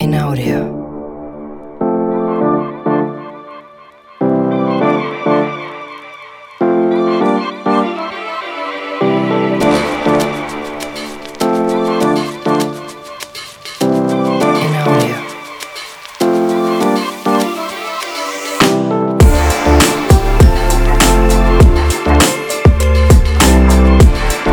Streetbeat; MokkaMusic

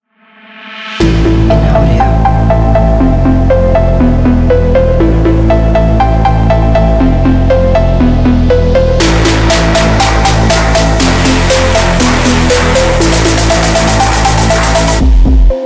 Phonk

